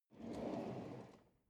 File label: drawer cutlery draw out